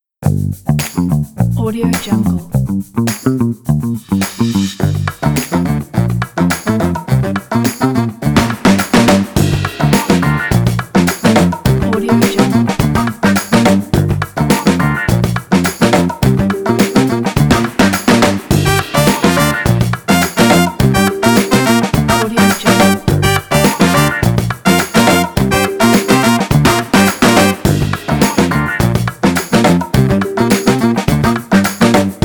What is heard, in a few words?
jazz band funk trumpet solo